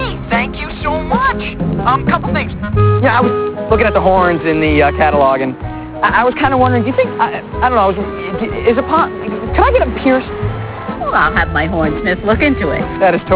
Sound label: horns; From "H-E-Double Hockey Sticks"; From "Boy Meets World," season 5